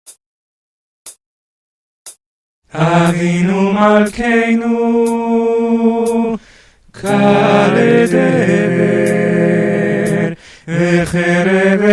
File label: all voices; BCC choir